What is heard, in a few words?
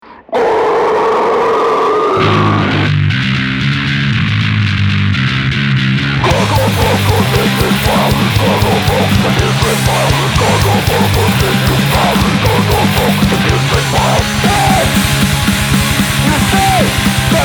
Gargle Vocals; ED-209